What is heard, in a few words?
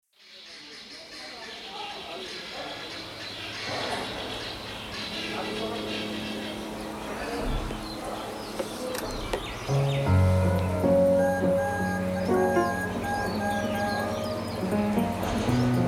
piano